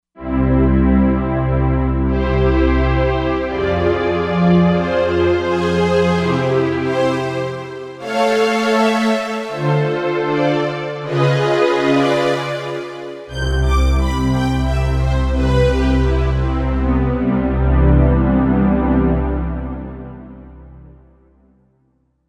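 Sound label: Ensemble Mix, synth strings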